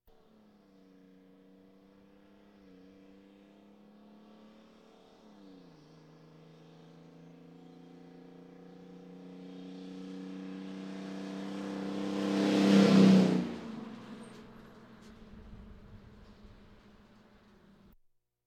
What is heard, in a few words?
Police, Fire engines